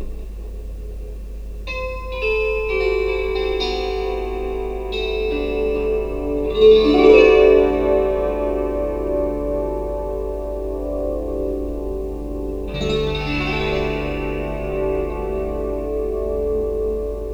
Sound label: drone harp